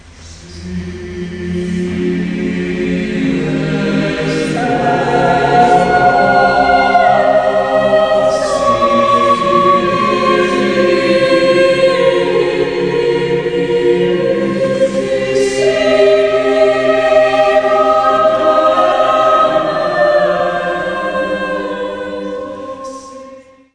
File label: caligaverunt oculi mei ringtone